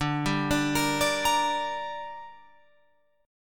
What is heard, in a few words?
D Suspended 4th Sharp 5th D down Guitar Open F, chord; arpeggiated